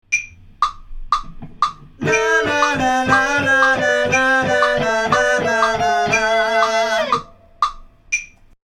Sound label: vocal